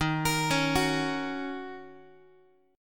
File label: D sharp Minor 7th, Guitar; arpeggiated; JGuitar